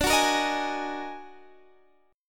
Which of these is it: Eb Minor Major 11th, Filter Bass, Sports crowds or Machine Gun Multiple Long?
Eb Minor Major 11th